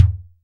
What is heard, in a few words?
Kick, Clavia Micro Modular